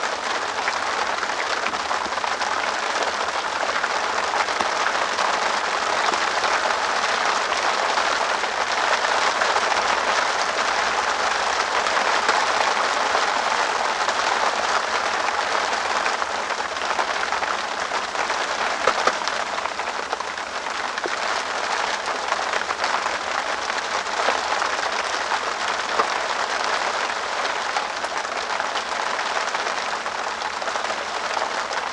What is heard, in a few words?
White Noise Splash; Splashing Rain